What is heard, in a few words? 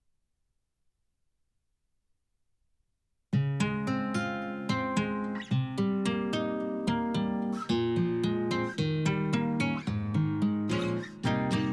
dolby S acoustic